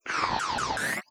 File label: alien sucker